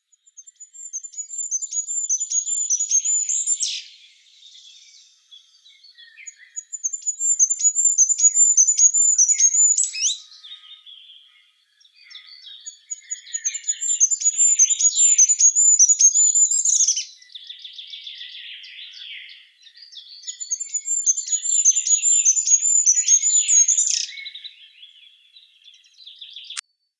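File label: goldcrest, regulus regulus; korolek zheltogolovii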